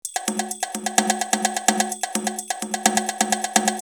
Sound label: 727 128, drumloops, DrumMachines; X-Static Goldmine